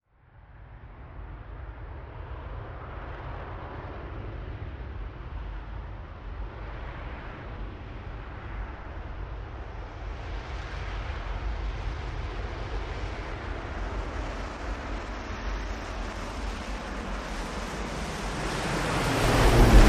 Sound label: AV-8B Harrier landing